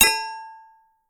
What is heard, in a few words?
metal pipe hit